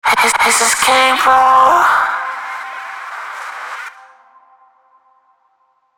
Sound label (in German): autotune viel inertia hohe snaprange wet; Rap Vocals